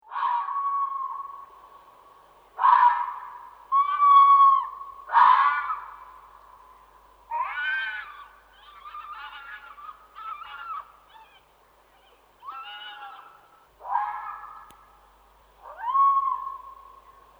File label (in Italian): volpe, Mammiferi; Monte Pisano; Animali; I Tesori del Monte Pisano - I Volume Gli Animali